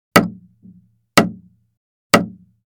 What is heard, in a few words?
Hammer hitting nail into wood hammering